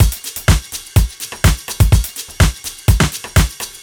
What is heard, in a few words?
housey full, 125 Beats